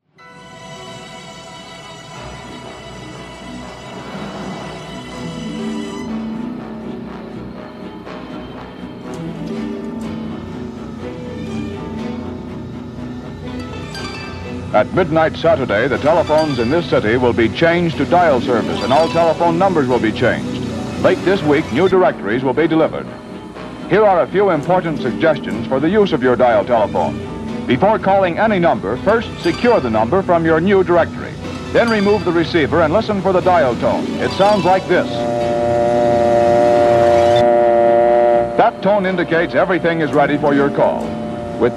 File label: HBO Dial Phone; Phone Losers of America Media Pack 2017; Live Show Sounds